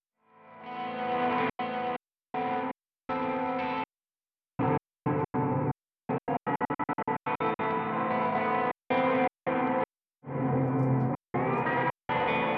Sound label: guitar